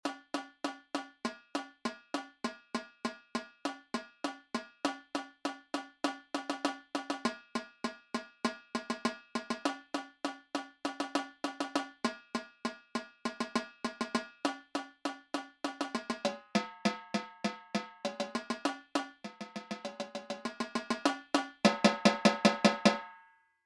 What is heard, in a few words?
tenors